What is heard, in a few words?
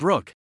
BROOK